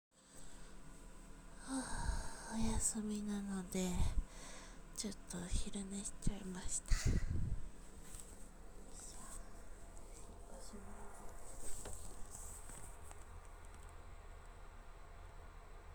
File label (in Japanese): お昼寝後のトイレでオシッコ！; 実演音声